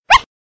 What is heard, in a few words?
Dog Barking